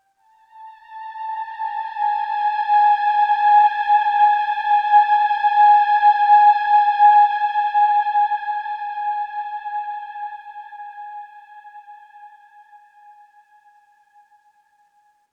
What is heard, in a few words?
Oneshot Synth